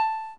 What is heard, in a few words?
Melody of Lute; Final Fantasy 4